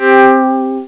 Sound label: 5th String; HyperComposer